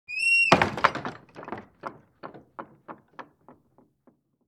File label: Barn Door Close